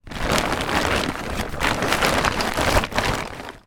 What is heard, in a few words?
tarpaulin movement; foley; zapsplat